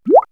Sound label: Bubble, perc fx; X-Static Goldmine